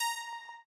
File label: arp